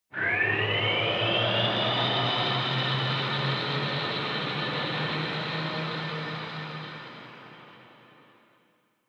Retro Anime Mech Equip

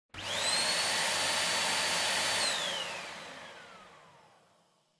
Vacuum Cleaner Vacuum

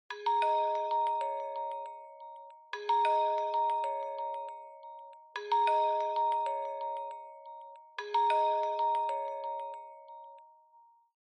Smartphone Alarm Speaker

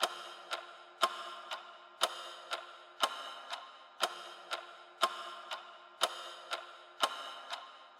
Clock Second Hand (Reverb Loop)

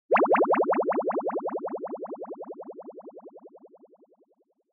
Anime Motion Mid Delay

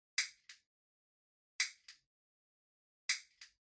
Lighter Far Click

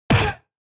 Hit Punch Dry; 打撃

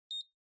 Printer Beep

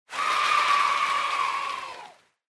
Cheer Yay High Applause

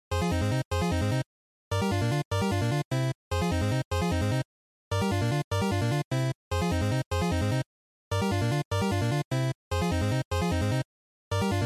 Shooter Power Up, bgm

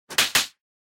Hit Slap, Dry; 打撃 平手打ち; ドライ

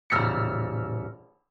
Piano Short Dry

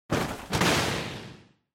Motion Fall Stumble

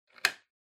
Wall Outlet Wall Lock

